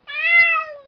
kitty food; minecraft; technic

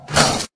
croc jaw snap; minecraft; technic

